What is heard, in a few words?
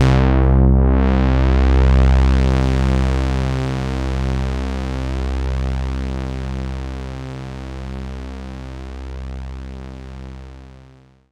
C2, Synth Line